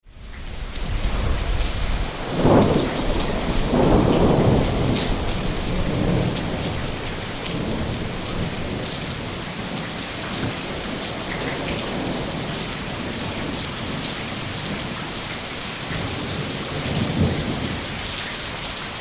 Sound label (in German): Regen mit Gewitter